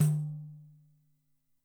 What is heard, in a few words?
PAN THM, Percussion; Sampleheads; NYC Percussionworks; ST PERC VOL2